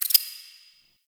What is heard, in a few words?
Under City Tiny Creature; Ambience; Dungeons; One Offs